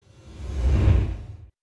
swoosh sfx, transition

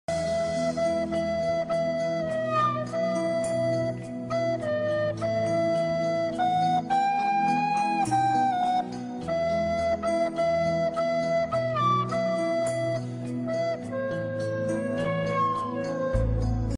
Flute Fail; The Titanic Flute Fail

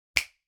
snap sound

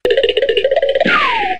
sound effect Running away

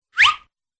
Cartoon put on

